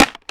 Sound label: empty shotgun new cock, guns; trracer